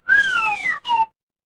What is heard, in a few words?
cartoon slide whistle